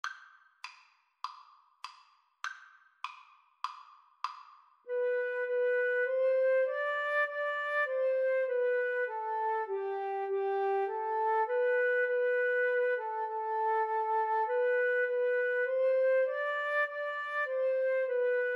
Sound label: joyful joyful, Violin Flute Duet